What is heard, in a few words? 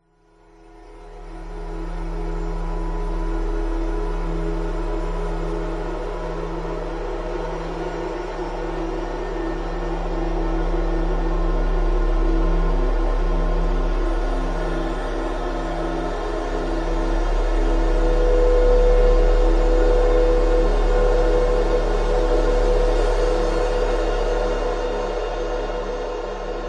sound design cinemtaic atmosphere dark eerie ominous danger; zapsplat